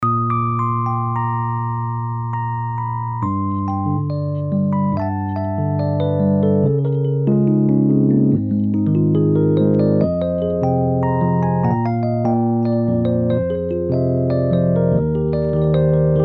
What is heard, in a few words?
rhodes; geneva